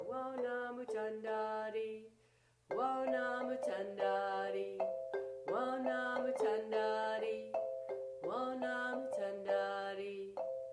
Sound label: voice